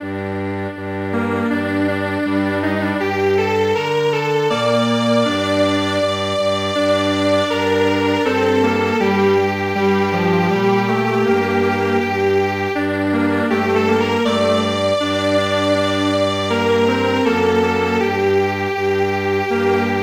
Strings Quartet; The Nanbu Cow Driving Song

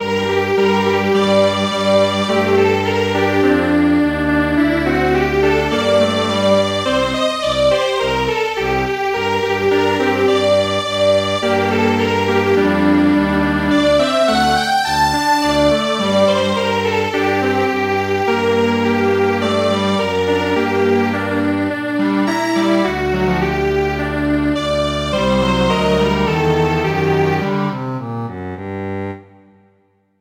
Strings Quartet; Canaries

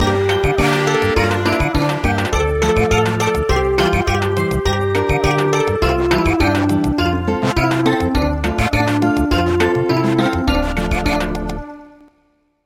Samba

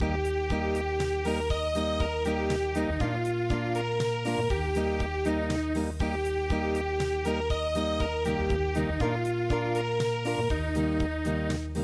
sing a round Unplugged

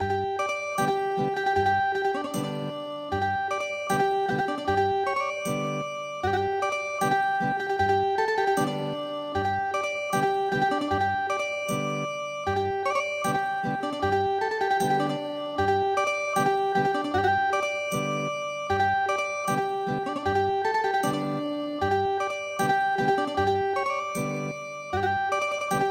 Ethnic Guitar

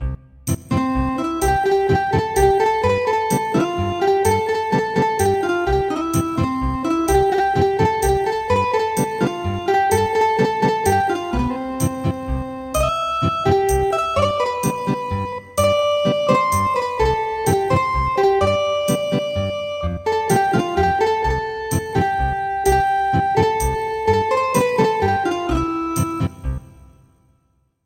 Ethnic Guitar, traditional

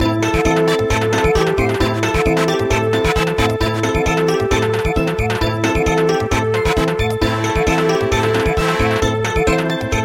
Samba

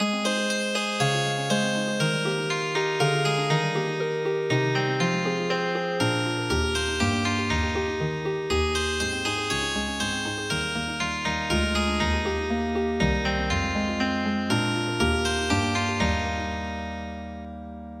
Healing Harp

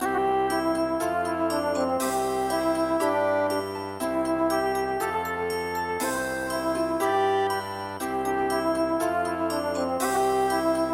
nursery rhyme, midi; donguri korokoro

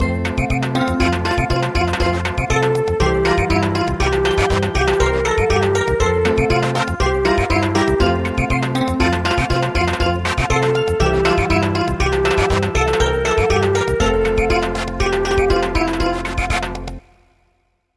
Samba; Morning tide

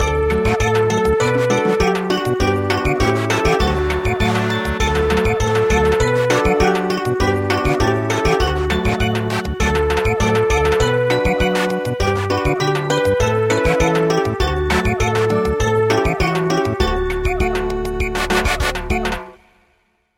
Samba; Many Years

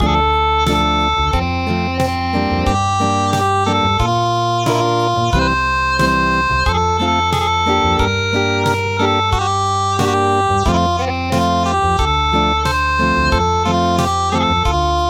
Acoustic

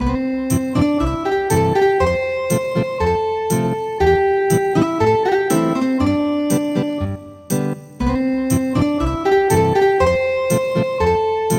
Ethnic Guitar

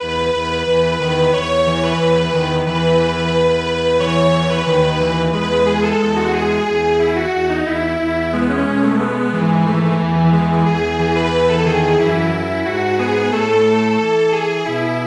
Strings Quartet; He is born

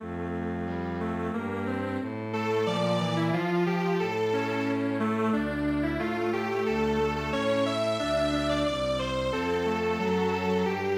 Strings Quartet; traditional

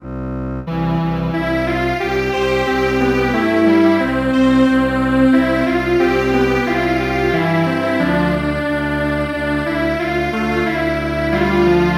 Strings Quartet; My Baloon

